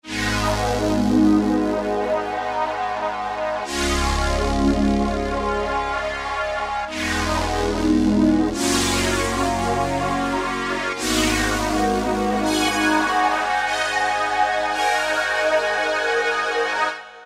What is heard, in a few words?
Thin Shocks, Generalmusic S2R